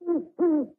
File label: owl sound; Minecraft; Votive Content